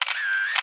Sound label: Snapshot; Foley; SFX; 7rystan's 600+ FREE EDM SAMPLES & DRUMS